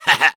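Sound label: laugh short, Spanish; Soldier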